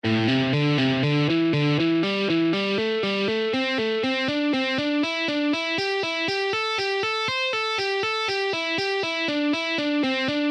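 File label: pentatonic, alternate picking exercises, guitar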